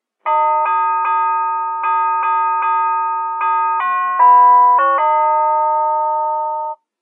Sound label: jingle bells, door chimes